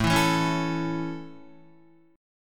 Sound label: A Major, Guitar; strummed